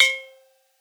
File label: Bonang Para EQ, Gamelan